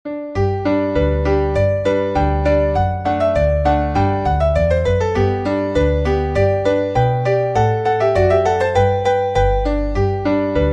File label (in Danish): Polka